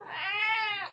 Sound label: cat